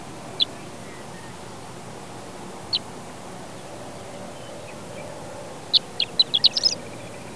alouette haussecol, chant

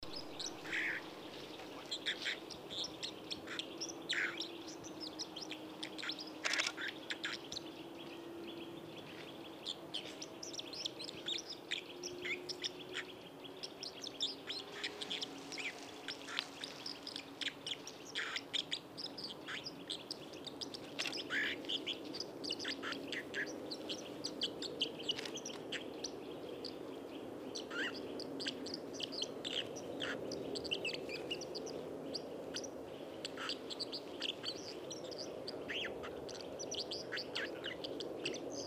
cincle plongeur, chant

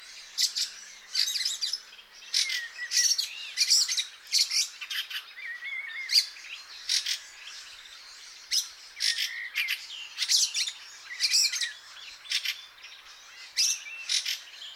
grive litorne, chant

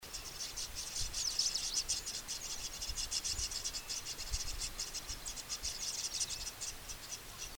etourneau roselin

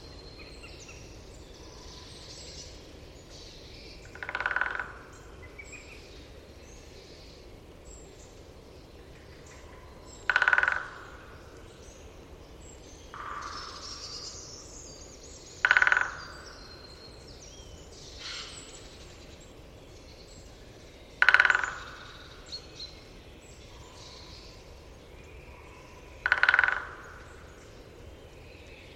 pic epeiche; chant

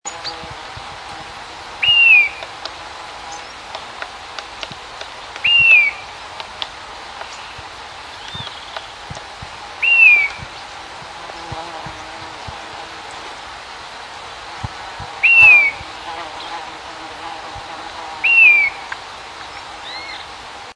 buse echasse, chant

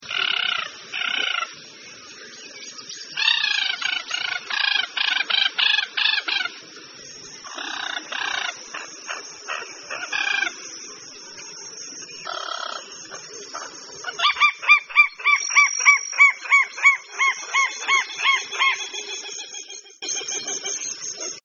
jacana a poitrine doree, chant

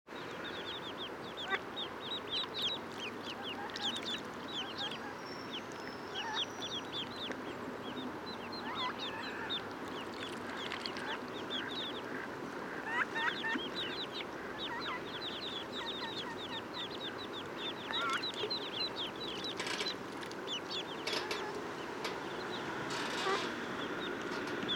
canard a sourcils, chant

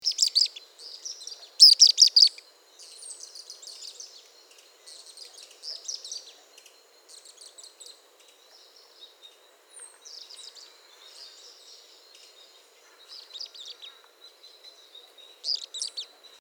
martinet de bolivie

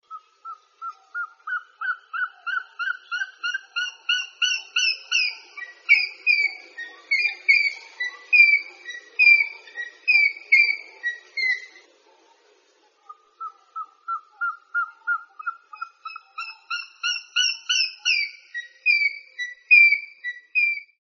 calao a bec noir, chant

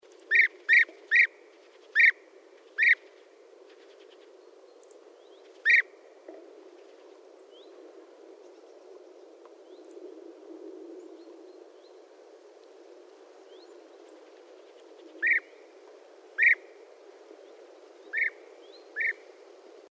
guepier d europe, chant

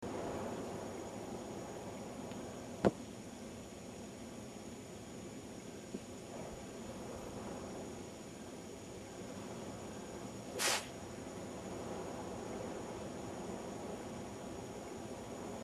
urubu a tete rouge

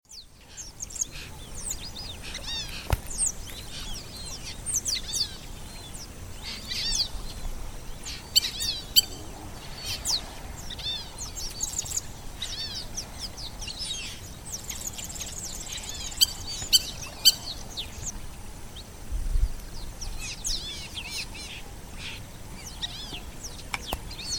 dendrocygne fauve

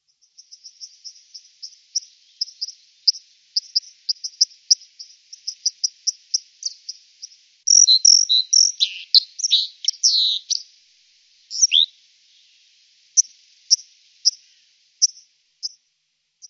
cincle plongeur

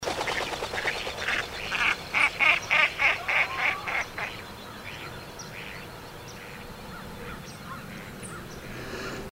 canard noir, chant

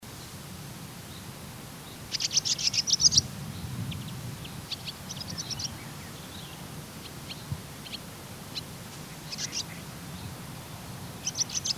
hirondelle rustique

